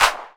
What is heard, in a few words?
clap, hip; Best Service; Dance Mega Drums